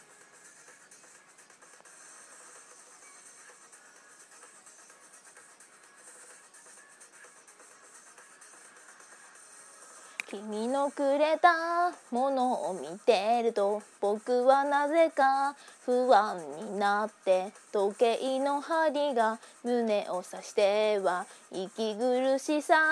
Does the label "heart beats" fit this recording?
Yes